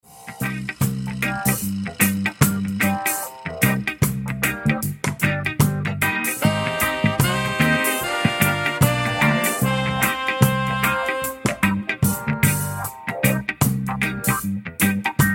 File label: reggae music ringtone; iphone ringtones